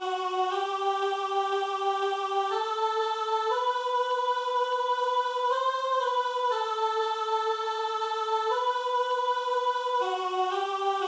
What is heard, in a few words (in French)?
aspiciens, sopranes